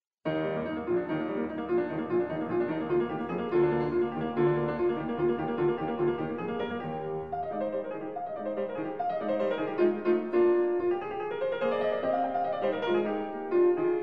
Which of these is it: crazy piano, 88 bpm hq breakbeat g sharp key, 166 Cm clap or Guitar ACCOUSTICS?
crazy piano